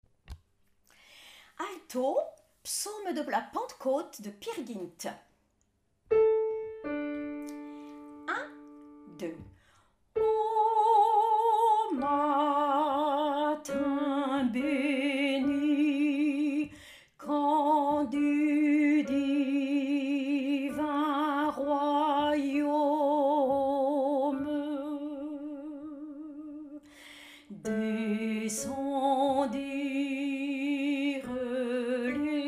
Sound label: Alto; Psaume Pentecote; Ensemble Vocal Chanteval